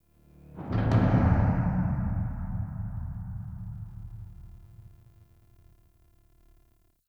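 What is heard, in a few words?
Metallic low frequency effects; Warframe; Ambience; Nineteen Ninety Nine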